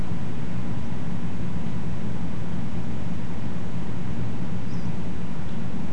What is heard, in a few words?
unidentified interesting 5-6.5kHz, nocturnal flight call; nocturnal flight call project in beijing autumn 2021